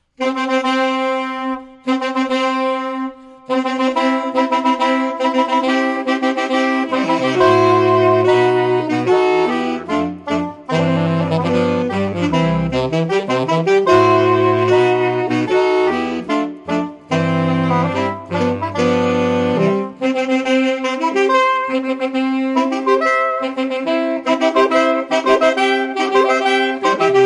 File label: wedding march